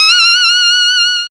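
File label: Saxophone Squeel; Zero-G Jungle Warfare 1, 2 & 3; 90 s Sample CDs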